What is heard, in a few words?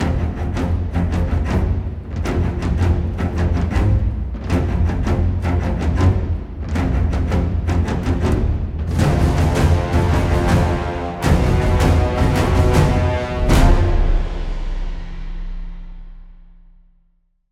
Combat High Threat Intro Long